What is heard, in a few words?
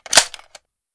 clip insert; valve